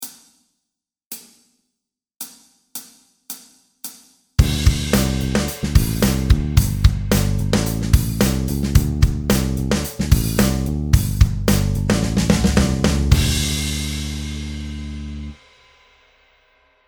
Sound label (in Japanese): カラオケ